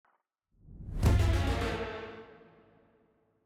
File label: upgrade fail